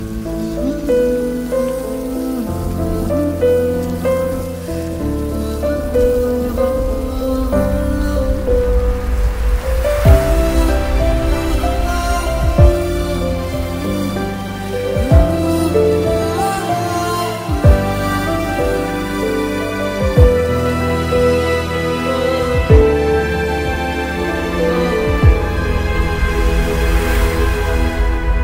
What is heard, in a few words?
Hindi Real Music Remix Ringtone